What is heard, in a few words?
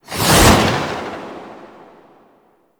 melee combo mode deactivate; Warframe; weapons